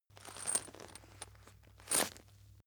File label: chain mail movement